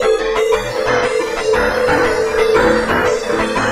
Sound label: Dx Groove; SFX & One-Shots